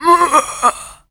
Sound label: pain, Zombies; zps; lea